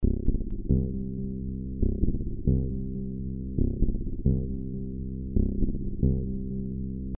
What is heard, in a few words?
loop baseline